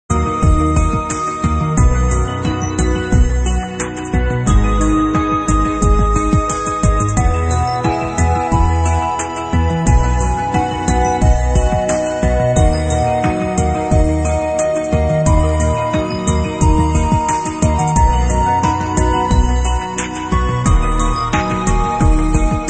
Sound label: Synth Sample